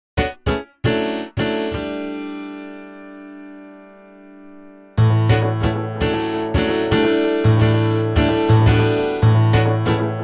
Vintage Oil Can Echo, Here, a warm slapback is created with little modulation, just to add width to the sound.; Dry and Wet mixed in Pro Tools; Tacky / Grandma; Olivera